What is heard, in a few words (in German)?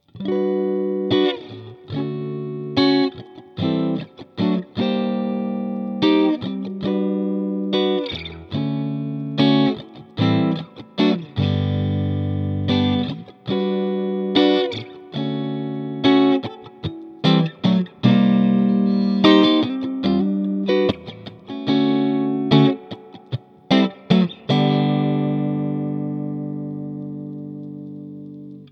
clean Mitte SC